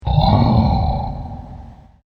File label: Deep Dragon Growl, monster; Monster Sound Effects; fantasy sound effect; horror; Andrew Fleming